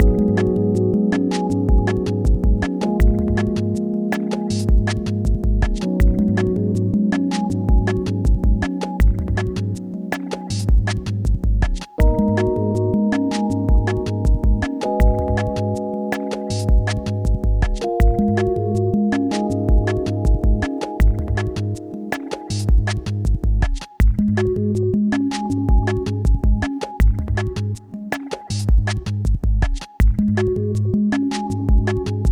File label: longer loop; pseudOs